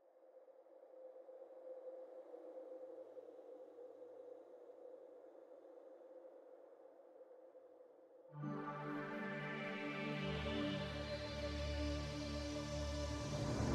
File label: orchestration; Eos